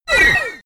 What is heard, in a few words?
sci-fi laser shot